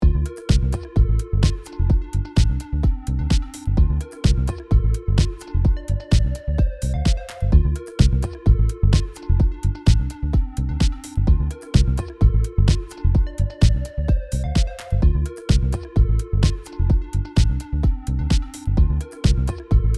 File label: Prog Bass; Plug Real